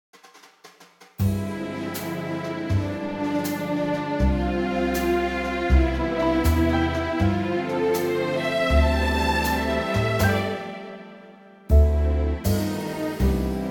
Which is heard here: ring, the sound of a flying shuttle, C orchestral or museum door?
C orchestral